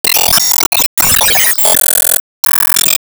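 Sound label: Battle Vs Mode In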